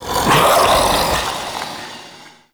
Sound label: Mockery Male Vocal Attack; Characters; Enemy; Vocals; Mockeries